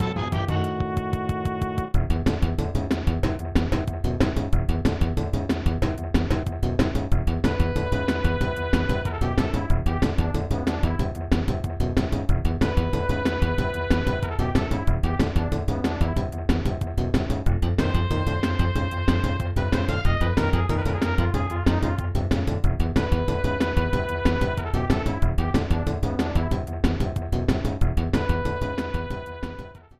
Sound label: Fortress Boss